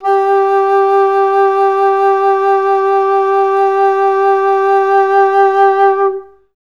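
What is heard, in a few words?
alto flutes sustain G3; Best Service; Peter Siedlaczek's Advanced Orchestra; AFS LONG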